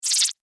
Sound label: Insect Voice Chirp, Insect, Voice, Chirp, Vocalization, Bug; Sound Effects:Animals:Insects